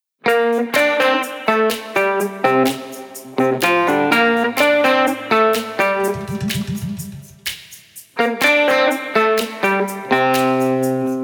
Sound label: amber keys